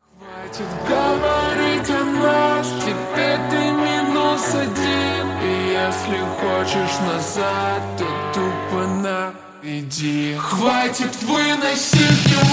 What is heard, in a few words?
slowed reverb